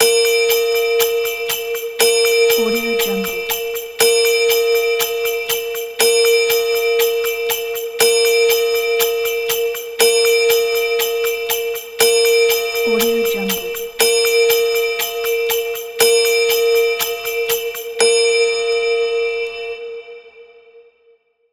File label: christmas countdown